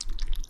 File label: Slimy, Slime; rimworld animations